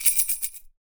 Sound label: YAQUI; AKAI S6000 CD-ROM - WorldSounds